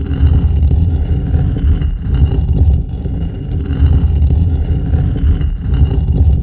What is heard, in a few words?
stone slide loop; ragemap2019